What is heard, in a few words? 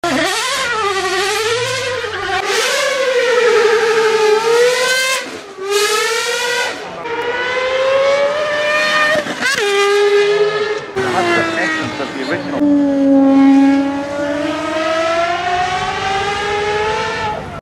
Gordon Murray, اگزوز